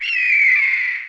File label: owl duke die